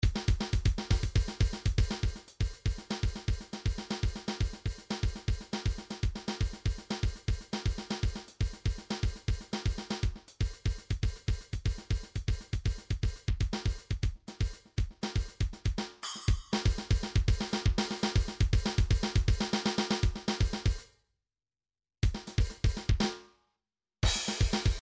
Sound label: drums percussion pushes, midi; Midi Vol 4 Drums & Percussion